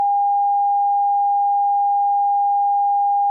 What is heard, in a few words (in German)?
Volumen gering